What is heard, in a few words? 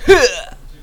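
Dodge, male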